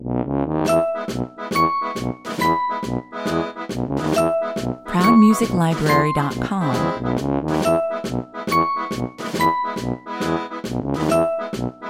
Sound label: circus lounge 123 bpm